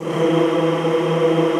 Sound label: E2-R, Extended Classical Choir; Best Service